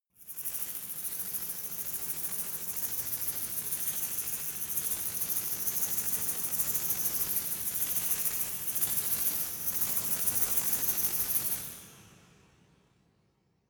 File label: resonator rattle